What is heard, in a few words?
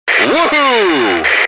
Woohoo!